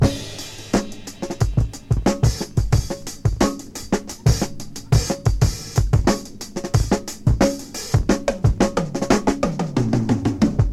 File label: 89 bpm hq breakbeat sample A key, kick tuned to the A note